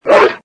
monster skill; croods

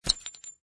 get goods; croods

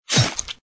hitted body; croods

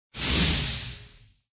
bullet create; croods

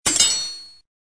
get goods; croods

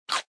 monster skill; croods

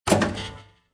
skill rotate end; croods

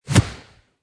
bodyhit; croods

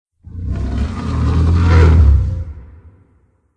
boss idle; croods

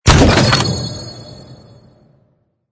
pet, ui; croods

